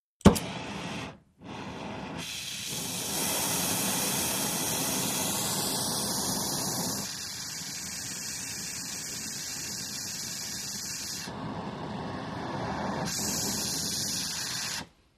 Welding, oxygen - acetylene, ignite & burn, varying flame